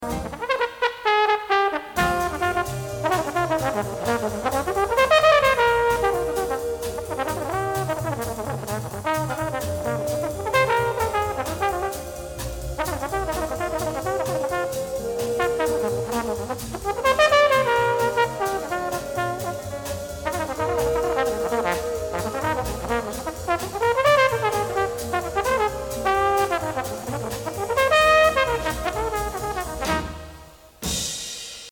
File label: Best Solo, jazz trombones